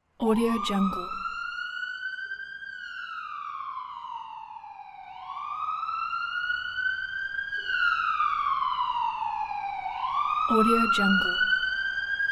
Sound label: ambulance siren